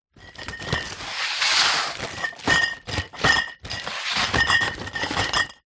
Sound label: bottles beer carton celephane wrapped movement; foley